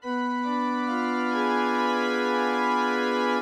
Halloween Organ

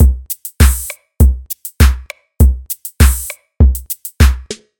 Hip Hop House

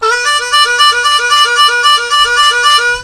Harmonica Note

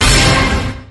Orchestra Hit

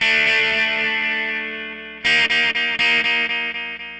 Guitar with Delay

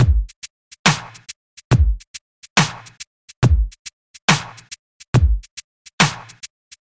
Fat Down Tempo

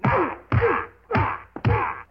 Kung Fu Kick Combo

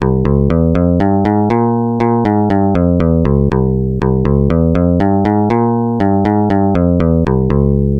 Jewish World Loops

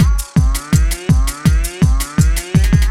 Oi Oi Hardcore

